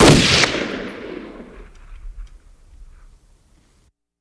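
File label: Gun Shot Single Shot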